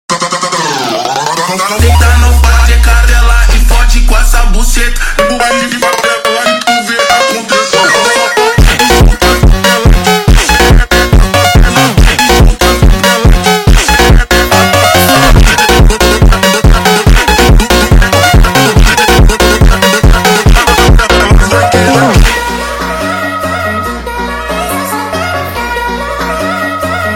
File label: Phonk, Sped Up